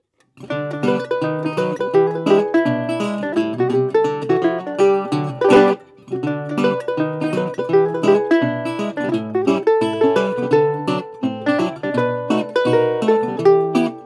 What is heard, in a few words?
Harmonica Rag